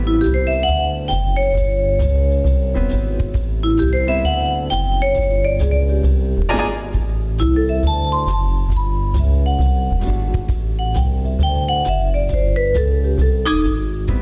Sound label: fakir jazz